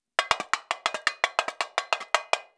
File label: Tamborim variation courante